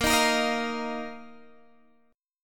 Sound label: A sharp Suspended 4th Guitar Standard; strummed; A#sus4; JGuitar